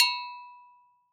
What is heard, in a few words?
Agogo; Cymatics; Emperor World Percussion (Teaser)